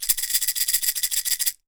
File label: PEYOTE; AKAI S6000 CD-ROM - WorldSounds